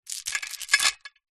chain thick movement wrapped around metal gate post; foley